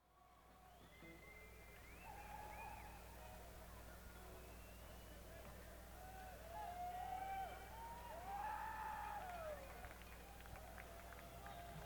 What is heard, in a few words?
Tuning